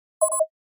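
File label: Flash Beep Hollow Electronic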